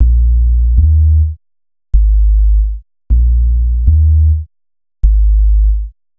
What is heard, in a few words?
Bass, Futurist Drum & Bass